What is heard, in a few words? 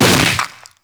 Infested Runner Arm Attack Hit B; Enemies; Abilities; Warframe; Proto Infested